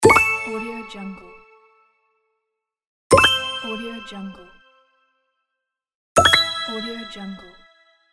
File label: score coin